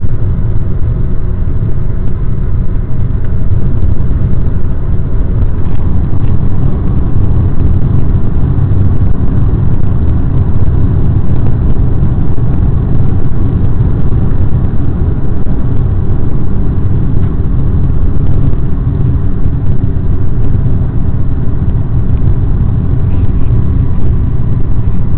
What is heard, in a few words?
inside car, ambient van